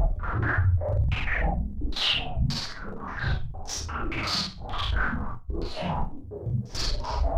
Moving Noise 130, Tempo Loops 130bpm; stereo toolkit samples

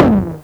MultiMoog Drums

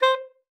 C5, alto sax; Xsample VOL-2

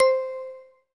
SYN, Roland, digital; Sound & Vision - Gigapack I CD 2

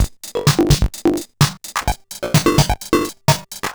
Bent TR505 (128BPM), Circuit Bent; glitch

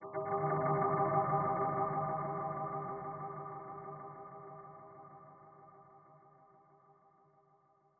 dark

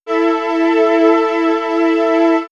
Swirls Ambient Drone, pads; X-Static Goldmine

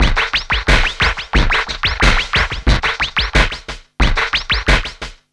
squeak beat, 90bpm; Zero-G; Freak Beats

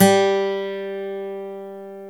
GTR G3, Dual Guitar; Club-50 - Foundations; Paul

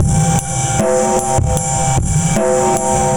Geiger Loop, Drum Loops Compressed; Zero-G Smoke

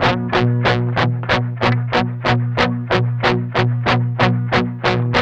MED ROCK 8TH C 3, Guitars; Spectrasonics; Hans Zimmer Guitars VOL-1; 102-MED ROCK

